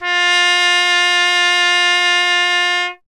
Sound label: TP F; Miroslav Vitous - Solo Instruments VOL-1; Trumpet